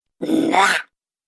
Goblin Spiteful, monster; horror sound effects

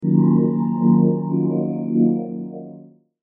Logo Synth Musical Sweeper Short; Sonic Logo Sound Effects; Production Element Imaging Element Accent Transition

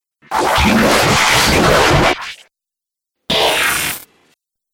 Dubstep Scifi Effects

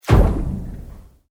Sweep Organic Fast; Created sweeps, swooshes and other movement effects; Sweepers; Sound Design; SoundEffects-Foundation4

